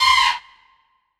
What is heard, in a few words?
DD SYN, Disco House; Best Service Dance Mega Disco House